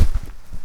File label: Footsteps Rug; Backrooms 2D